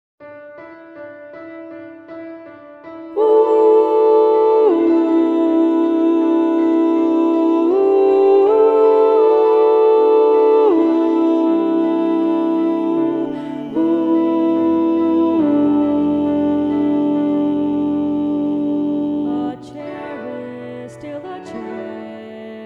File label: Alto 2